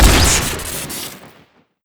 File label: shoot crit; weapons; tf; peacemaker